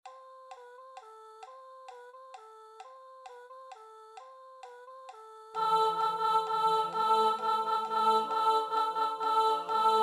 alto

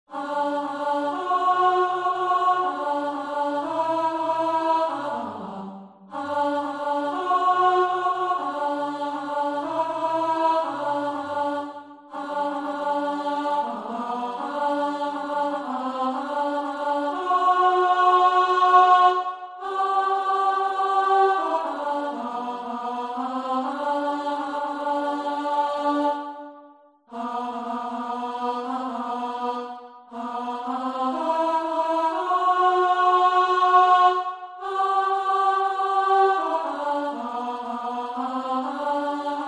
Alto

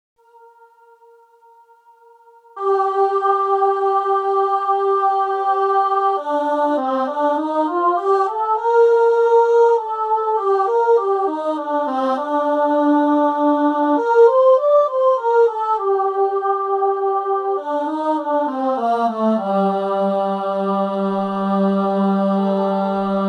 Good King Wenceslas Minor Alto

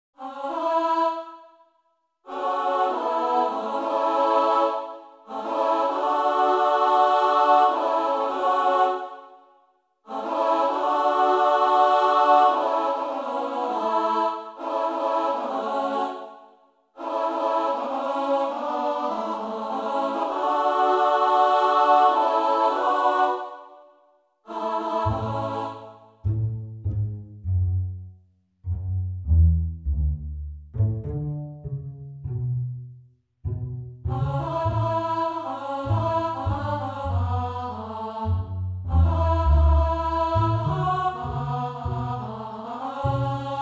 Rain All

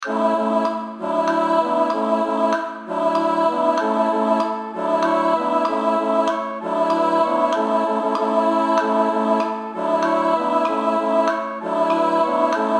Alto sans insts

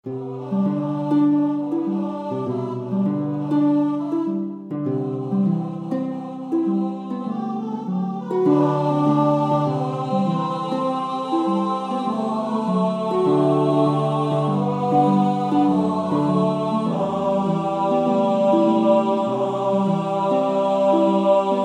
Tenor

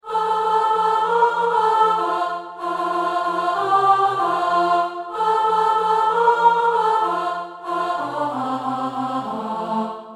Alto

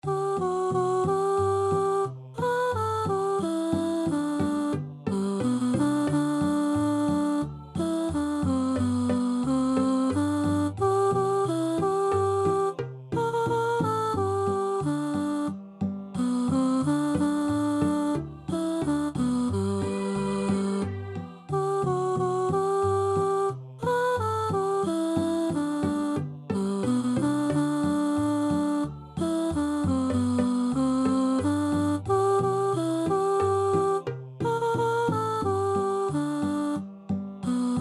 Murray River Chorus Tune

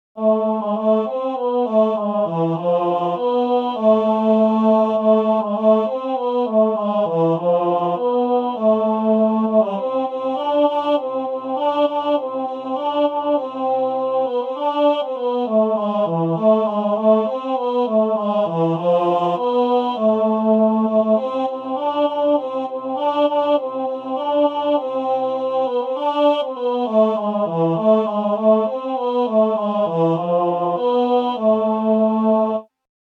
Tenor

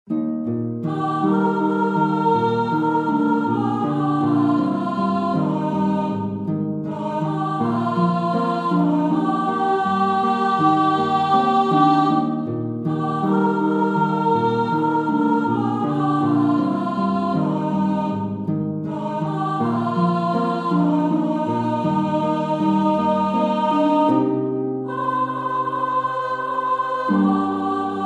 Sop Bottom

